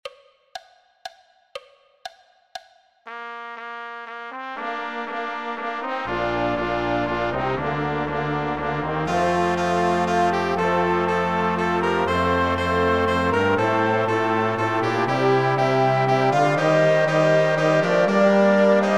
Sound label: Intrada Orchester; Pezel Intrada Tempo 120